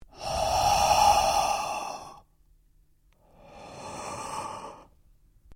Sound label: Male Heavy Breathing Breath; male vocalisations; Human